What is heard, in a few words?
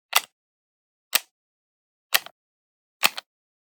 Generic Button Metal Small